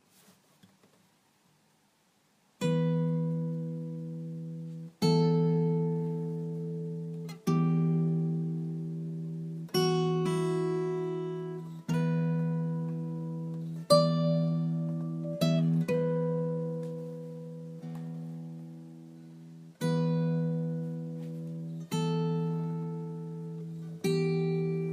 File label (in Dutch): instrumentaal